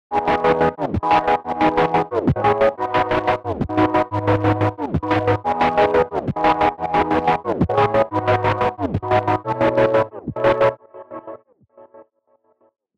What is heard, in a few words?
Dreamy Glitch, 90, Em